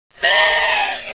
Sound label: horngun down, weapons; svencoop